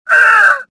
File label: choke, worker; worker1